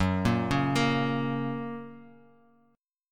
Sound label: F sharp Major, Bass Standard; arpeggiated; JGuitar